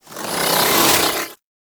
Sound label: Bow Draw; Weapons; Warframe; TheNewWar; Omicrus